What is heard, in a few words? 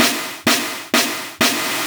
Fills 128BPM, Clubsounds